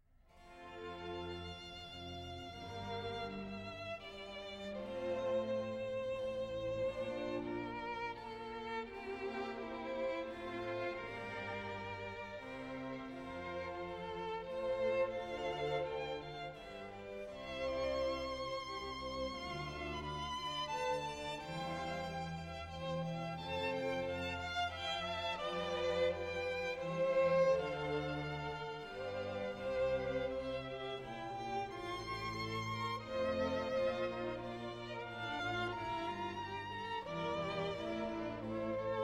Double Violin Concerto in D minor - Second movement; Children Of A Lesser God, 1986